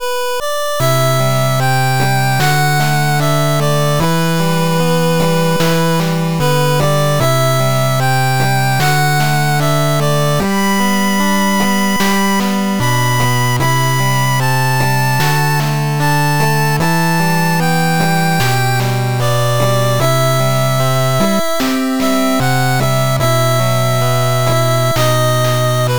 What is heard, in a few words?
8bit